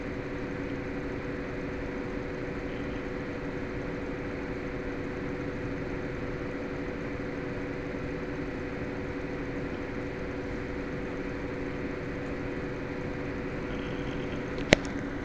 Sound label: noise busy; ENGTX580DCIIreview